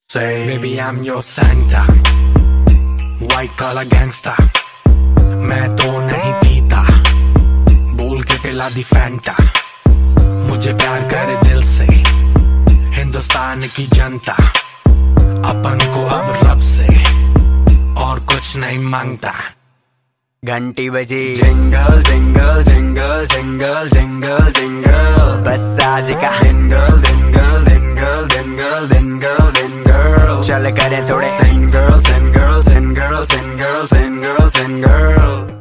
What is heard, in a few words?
Jingle Bell Song Ringtone